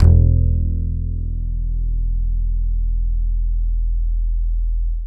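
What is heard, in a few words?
acoustic bass slap E1, left; Masterbits CLIMAX 7 - Bass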